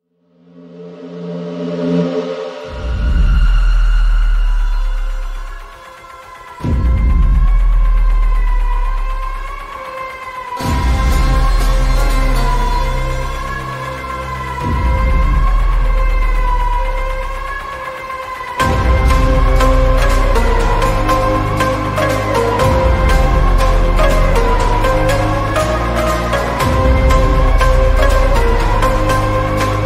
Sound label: instrumental ringtone remix ringtone; KGF